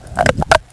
fast shoes; Escape Beyond 2001

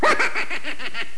imp

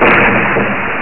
little boom; Exile II - Crystal Souls

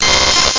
woorgh; Epic Collection 3, The; Epic Marketing; muzak

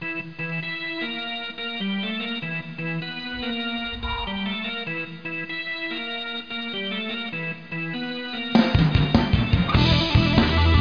ORGAN RIF

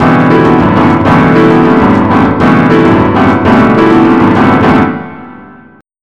BOSSA; BUSKER L

